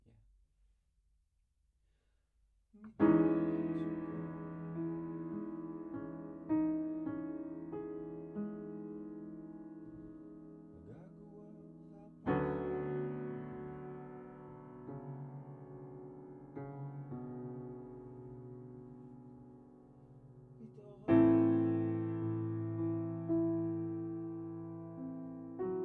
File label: Piano Low